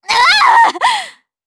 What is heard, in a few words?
Damage, jp; DarkFrey; kingsraid